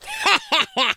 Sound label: laugh